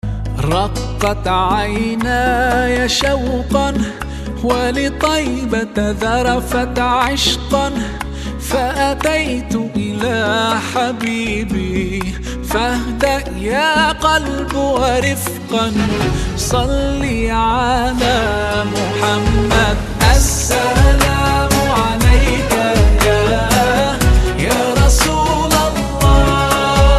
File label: (Arabic Version - Bonus Track)